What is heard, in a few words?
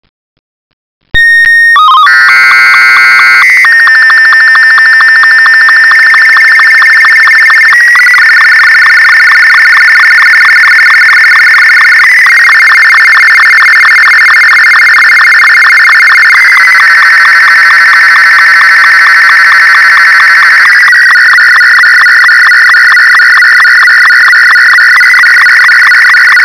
SSTV, shortwave audio